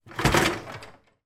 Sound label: household dishwasher wire basket pull out